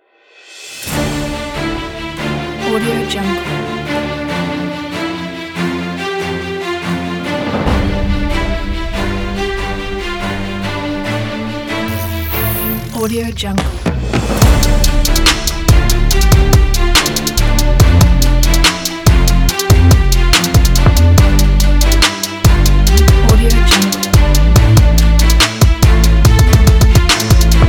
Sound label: dramatic epic trap